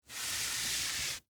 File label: Rope Pull Heavy; Hand Tools (Sound Effects:Tools); BlastWave